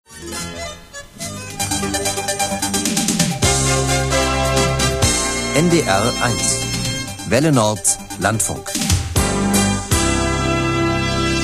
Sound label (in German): welle nord landfunk, jingles